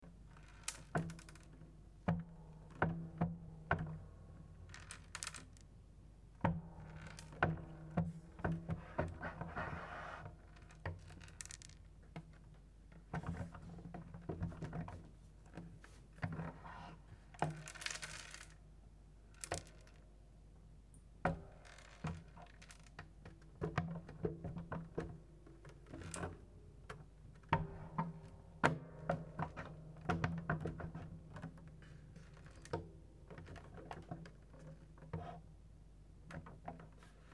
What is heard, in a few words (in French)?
deplacement, harpe